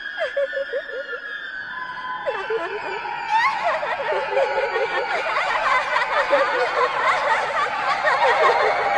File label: Creepy Laugh